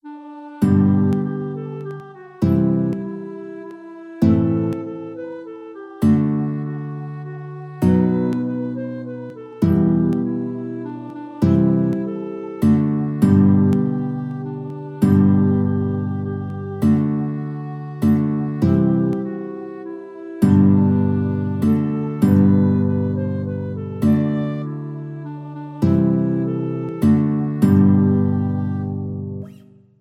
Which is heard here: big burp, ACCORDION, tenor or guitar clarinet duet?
guitar clarinet duet